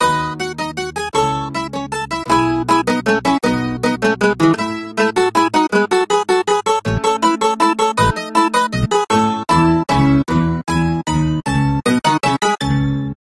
Mandolin; The Bard's Tale Remastered Trilogy

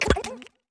down test

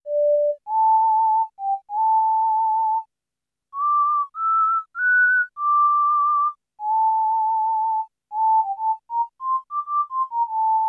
whistle version

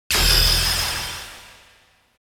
Orange Credit Obtainment Jingle; Metroid Prime Remastered

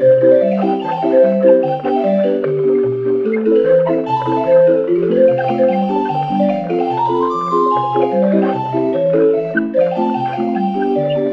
Barrel Organ; my tamagotchi forever android ios

